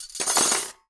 Dirty Cutlery, Foley; TRUTH-HZ; Crowdsourced Sample Pack

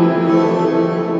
pjano chaos, Piano & Keys; TRUTH-HZ; Crowdsourced Sample Pack

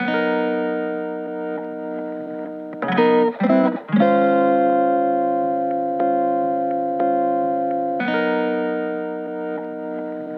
guitar; Crowdsourced; TRUTH-HZ; Crowdsourced Sample Pack

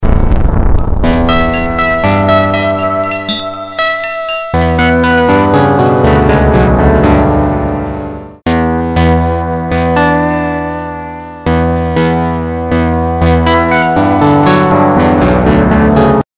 lofi melody, Instrumental Loops; Crowdsourced Sample Pack; TRUTH-HZ

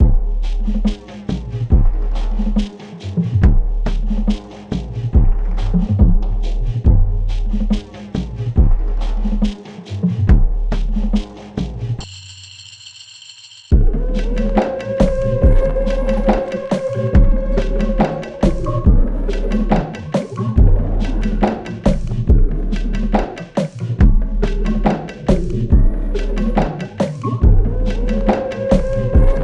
Tribal DRUMS; Crowdsourced Sample Pack; Drum Kit; Drum Loops

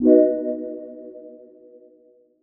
bubble bath bmaj, One Shot Synths; kev s; Crowdsourced Sample Pack; TRUTH-HZ

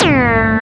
tdeaoummm; Truth-Hz - 1-Hit KO Sample Pack

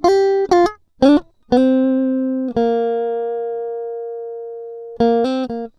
guitar; TRUTH-HZ; Crowdsourced Sample Pack

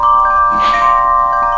Chimes; Mallets; TRUTH-HZ; Crowdsourced Sample Pack

